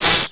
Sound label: ag buzz, hornet